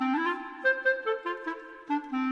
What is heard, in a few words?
Flute